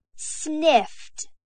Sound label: sniffed